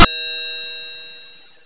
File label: FINE BELL; BELLS